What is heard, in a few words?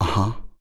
perc voice, Roland MC-909